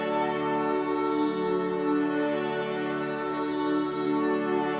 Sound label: Pad Loop D, shimmer and sparkle